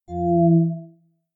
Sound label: battery low; stereo; ocean